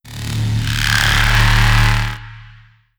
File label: shockwave tell bass